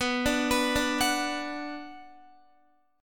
B Minor, Guitar; arpeggiated; guitar chord

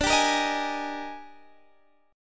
Eb Major 11th D

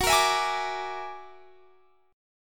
F sharp Augmented 9th, Guitar, Standard; strummed; F#+9 chord; JGuitar

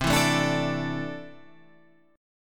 C 6th Add 9th, C, down, Guitar, Half Step Down; chord

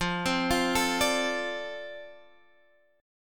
F 6th F down Guitar Drop D

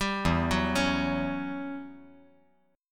E Minor 6th Add 9th chord

